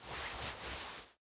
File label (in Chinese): 院长房间 下床; 序章